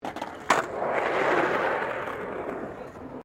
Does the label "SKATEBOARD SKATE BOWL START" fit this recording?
Yes